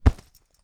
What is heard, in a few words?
Punching Light Armour